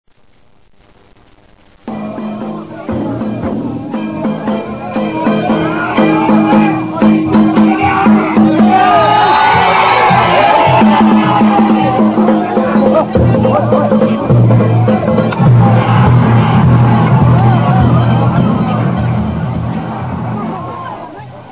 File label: danjiri sounds